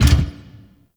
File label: Gear Shift Down, Motorcycle; Warframe; Vehicles; Motorcycle Ninety Nine